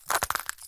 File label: step; tungtung cursed; tungtung sahur curse